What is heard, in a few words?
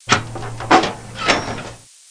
File Cab